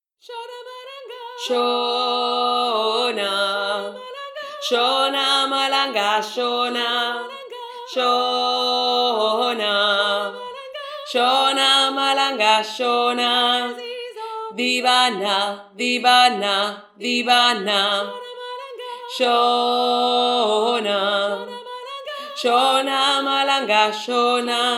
4 VOIX

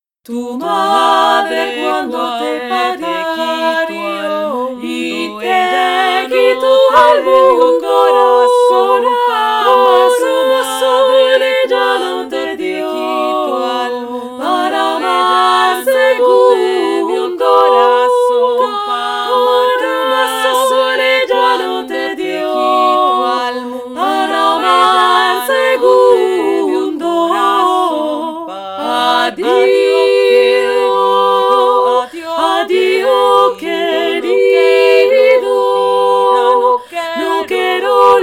3 voix

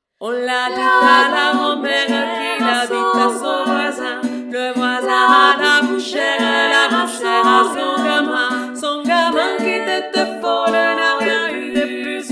toutes les voix

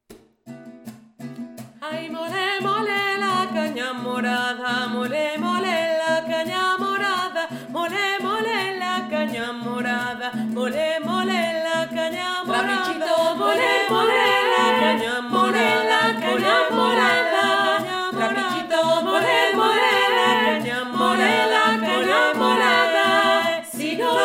3 voix

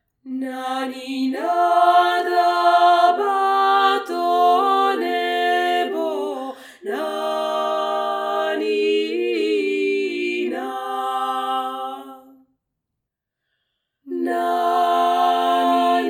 3 VOIX

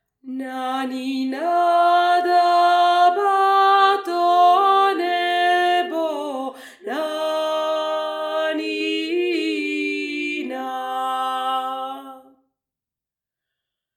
VOIX PRINCIPALE

